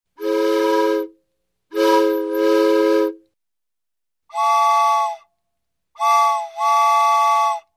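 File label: locomotive whistle; gudok parovoza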